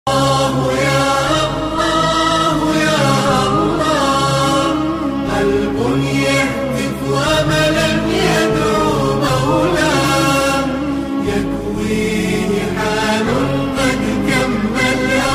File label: Beautiful Islamic Ringtone